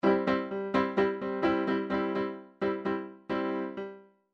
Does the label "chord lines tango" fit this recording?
Yes